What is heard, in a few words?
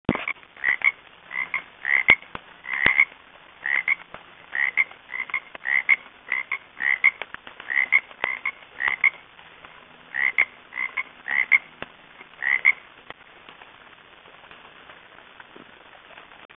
frog ribbit; birds